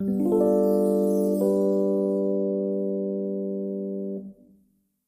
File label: wood reveal